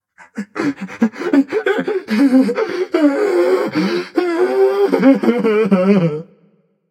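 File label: nonverbal boohoo; smite; voicepack; merlin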